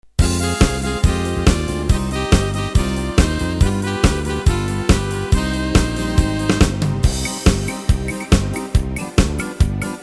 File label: Yamaha tyros; EVO MIX; cardase